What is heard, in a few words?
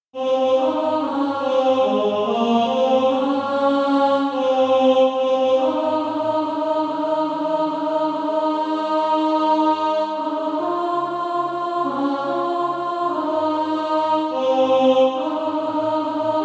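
alto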